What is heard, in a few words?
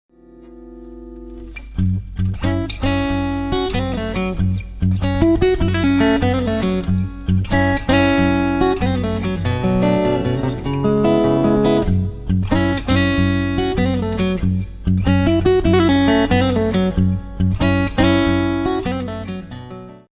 blues